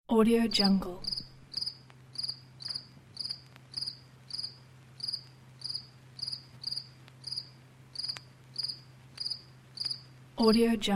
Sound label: cricket sound; alexnaobum